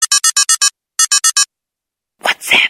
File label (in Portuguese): Whatsapp Alerta